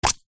household insect repellent roll on plastic lid remove